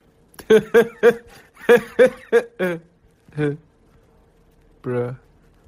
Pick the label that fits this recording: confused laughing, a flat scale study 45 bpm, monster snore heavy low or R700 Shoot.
confused laughing